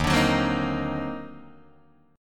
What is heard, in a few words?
Eb Minor Major 13th, Guitar, DADGAD; EbmM13; strummed